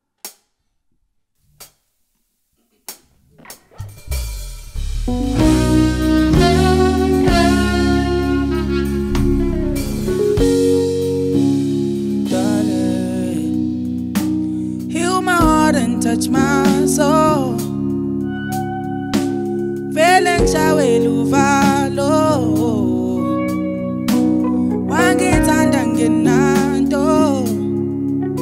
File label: Acoustic remix, Afro Pop